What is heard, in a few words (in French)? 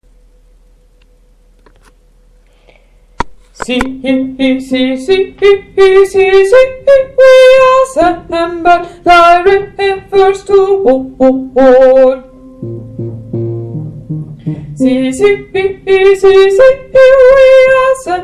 choeur basses; choeur du froid